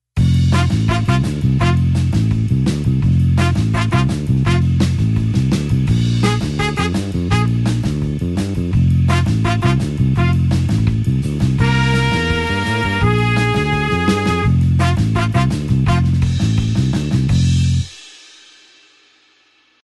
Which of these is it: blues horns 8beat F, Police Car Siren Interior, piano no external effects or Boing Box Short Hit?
blues horns 8beat F